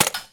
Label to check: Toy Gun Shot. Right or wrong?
Right